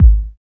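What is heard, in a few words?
bass drum; E-Lab; X Static Goldmine 1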